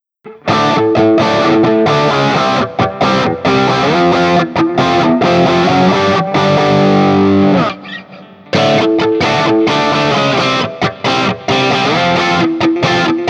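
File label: JCM800, guitar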